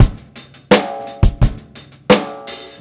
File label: beat, Roots Funk; USB Soundscan vol.41; AKAI